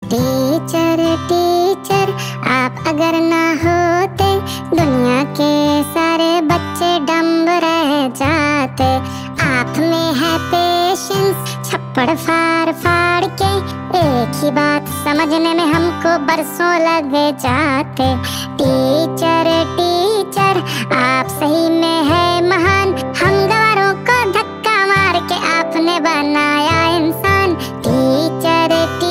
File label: Ringtone